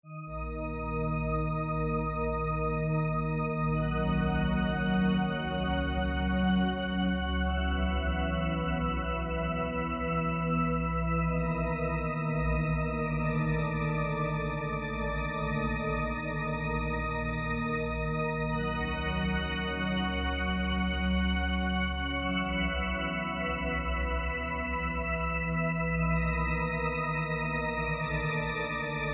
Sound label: zebralette wavewarp test